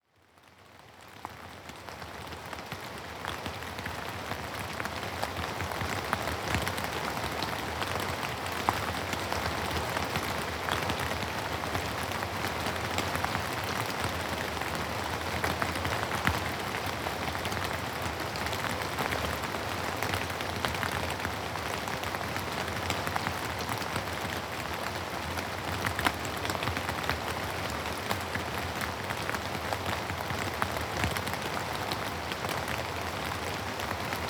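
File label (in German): Regen zum Schlafen, Regengeräusche Entspannungsmusik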